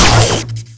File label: run hit